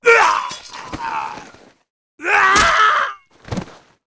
Hero demon hunter death

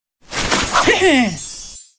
Emote Christmas Hog Rider

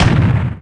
turret

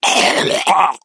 die

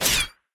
dryfire sfx; 健次; 传奇

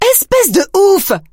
hurt vo

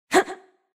basic attack; zh

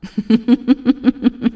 laugh

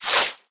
Bullet grass; rico

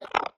strider idle

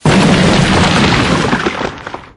golem die

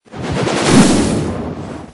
fireball

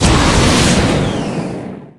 gadget rocket

